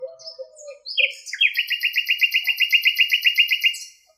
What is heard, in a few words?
bird singing, amsel, blackbird; soundscapes